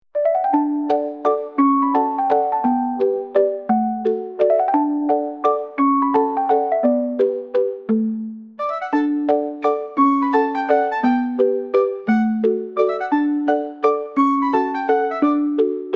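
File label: puppy love waltz, happy children's music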